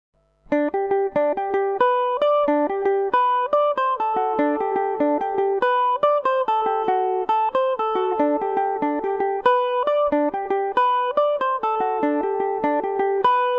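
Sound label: jig G major revelation, irish mandolin; The Galway Jig; Revelation Jaguar electric mandolin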